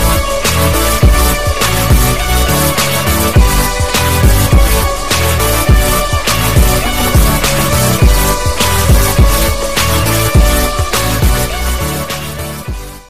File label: electro, ringtone